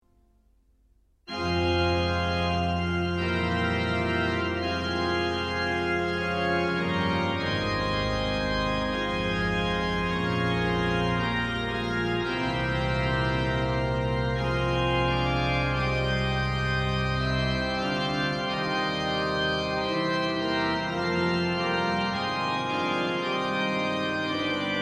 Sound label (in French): Plein Jeu; Boyvin; Suite